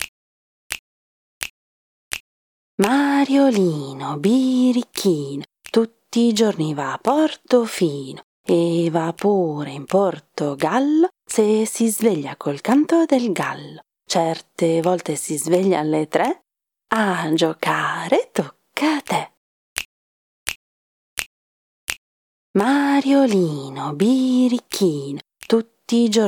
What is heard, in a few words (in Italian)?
Mariolino